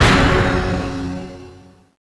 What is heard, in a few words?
drama pound